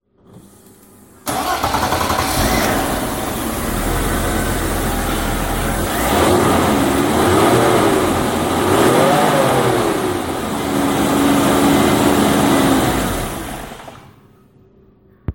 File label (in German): mercedes benz r107